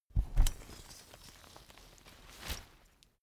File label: umbrella big close full soft